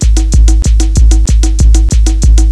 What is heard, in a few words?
THE BEAT